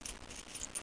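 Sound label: digital brass, keyboards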